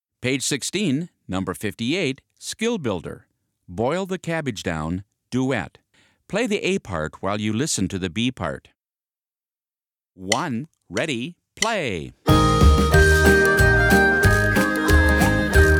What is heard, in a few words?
Duet; Skill Builder Boil the Cabbage Down